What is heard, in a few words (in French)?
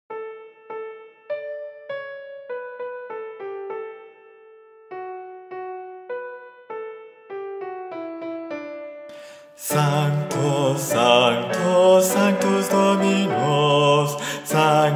Guide voix Basses; Missa Pro Europa - Sanctus